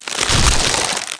wattle fence; World of Tanks Blitz

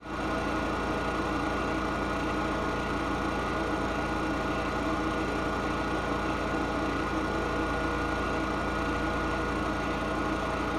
submarines engines; World Of Warships

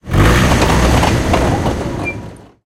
tank engine int load; engines; War Thunder

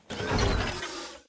ship engines; World Of Warships

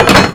knife wood; Call of Duty Modern Warfare; physics

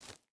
footstep burlap gravel jump start; Rust

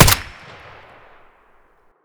close silenced; rsass; Escape from Tarkov

